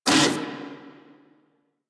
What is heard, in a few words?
Amored Vocal Pain; Enemies; Armored; Warframe; Kubrow